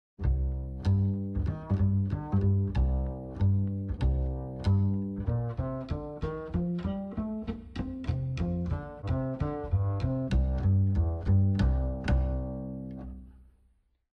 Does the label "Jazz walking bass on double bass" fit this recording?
Yes